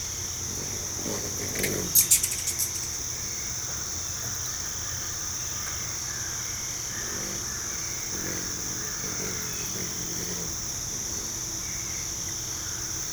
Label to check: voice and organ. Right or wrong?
Wrong